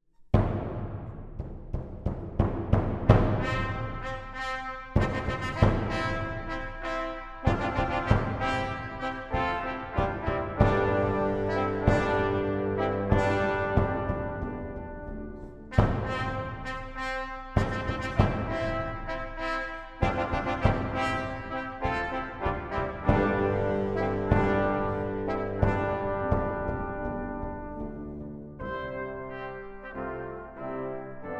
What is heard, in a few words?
Introit & Fanfare